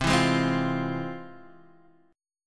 F Minor Major 7th, chord

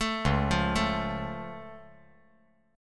C sharp Augmented down, chord; arpeggiated; JGuitar

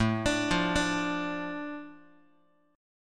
A Suspended 4th Sharp 5th A down Guitar, chord; Asus4#5 arpeggiated; JGuitar

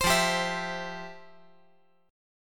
F Major 7th Suspended 2nd, F, down, guitar

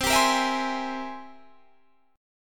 C 7th Flat 13th C, chord

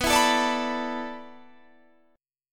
D 6th Suspended 2nd B, chord; D6sus2/B strummed

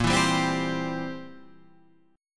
C Major A sharp; strummed; chord; JGuitar

